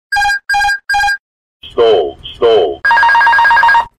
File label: Airbus stall warning